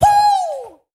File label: poo, Voice ooh ahh